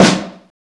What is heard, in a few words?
SD, Hard & Loud Techno; USB Soundscan vol.01; AKAI